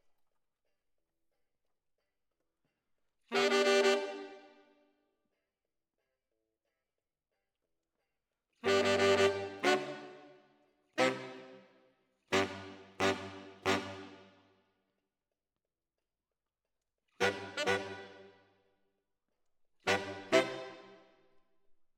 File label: ORTF SCHOEPS, piano